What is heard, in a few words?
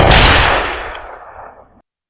tusken shoot